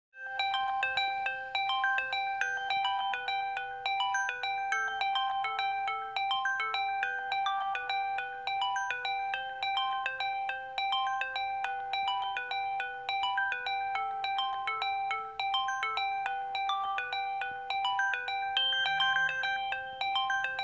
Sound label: Running